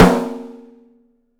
OPEN STEEL SN; 3D Drums

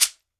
SHEKERE, Drums&Percussion; E-MU Producer Series Vol. 7 – Old World Instruments

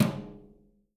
BRUSHES, Living Drums

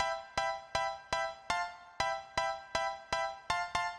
Piano Riff A-F, 120bpm; Big Fish Audio; Old Skool House; Construction Kits; House of Amnesia

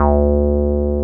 303 SQR D3 F, SQUARE BS

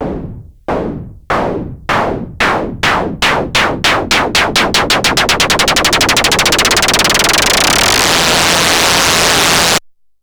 MiniBrute, Filtered Noise; noise hiss crackle

